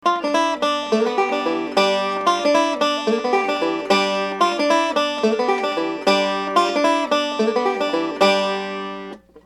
substitute for famous g lick, banjo

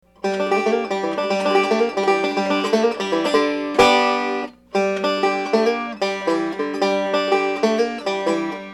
famous g lick normal, banjo

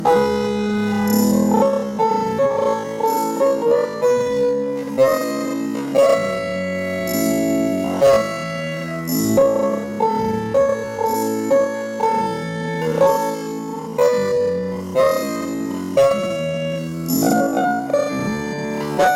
triplets, banjo

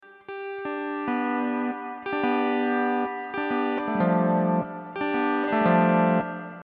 triplet backrake

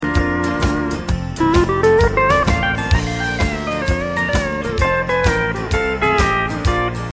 chop, e9th

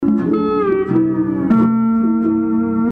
pause on last note

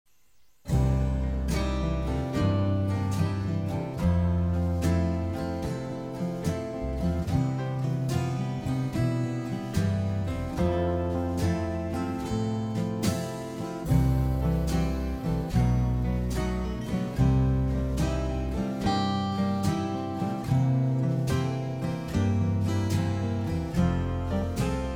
no birds or waves slower

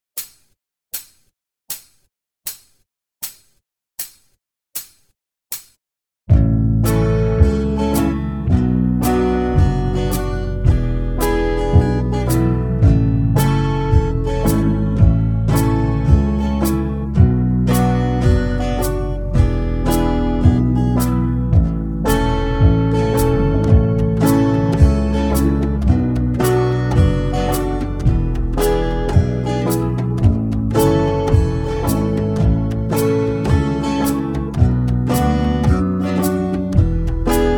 key of a slow, banjo backing tracks; dunphy's hornpipe

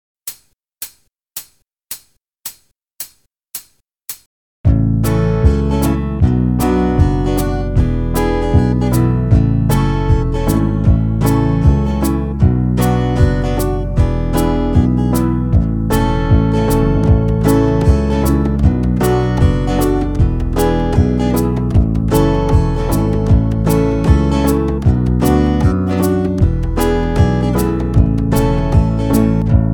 key of a normal, banjo backing tracks; dunphy's hornpipe

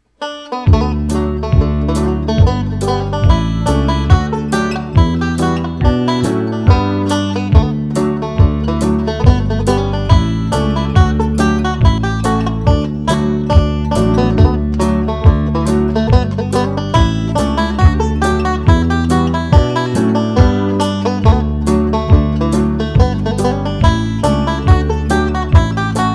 boys of bluehill, banjo

